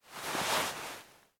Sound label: Rubbing